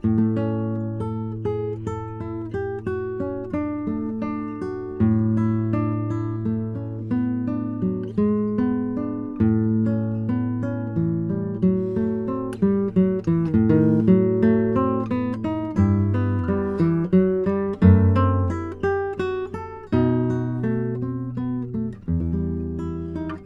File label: gitara, Neumann TLM170